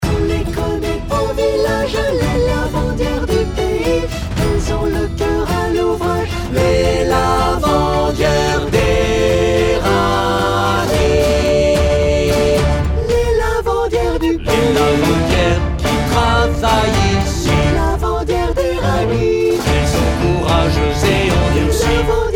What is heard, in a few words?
Les lavandières d Eragny Baryton